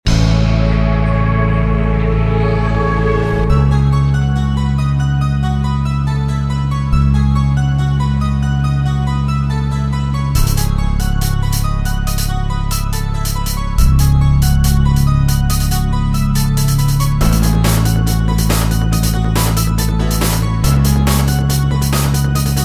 battle; Manamon Music; Manamon 2; Oghma

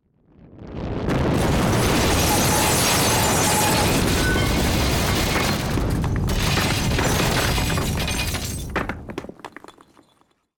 destroy; RS Games Sounds; Battleship

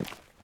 ash step; Golden Crayon Sounds

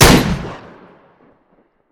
sniper; Original TK Sounds

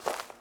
pine step; Golden Crayon Sounds

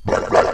Popup Appeared, Horror pack; ESAN VO Sound Schemes

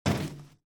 grate step; Original TK Sounds

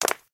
marble land; Golden Crayon Sounds

